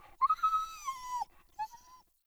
Wolf Whine Concerned; Animals; Perception

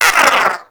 Wyrm Idle, Vocals; Pets

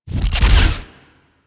Shock Rifle Alt Pre Explosion

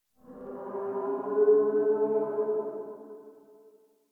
Exterior Distant Calls Scared, Ambience

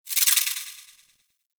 Foliage Insect; Ambience; Props; Warframe; Duviri

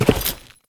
Step Default, Footsteps

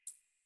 Computer Room Beep Misc

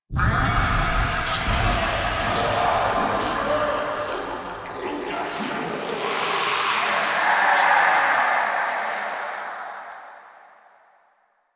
Ghoul Call; Warframe; Sacrifice; Courtyard

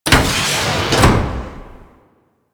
Circular Plate Large Lower, Traps; Warframe; Orokin; Triggers

